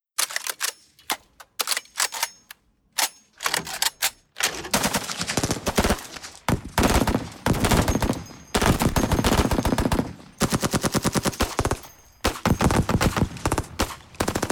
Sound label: Gun